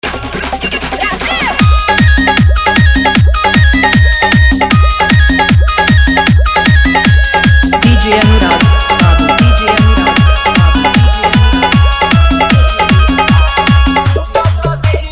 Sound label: Full Dhollki Bass Rod Dance Mix